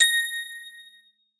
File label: boop send